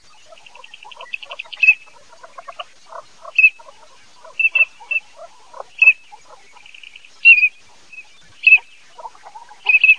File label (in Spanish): BARCINO